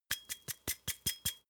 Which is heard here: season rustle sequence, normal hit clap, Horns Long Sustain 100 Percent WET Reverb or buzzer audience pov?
season rustle sequence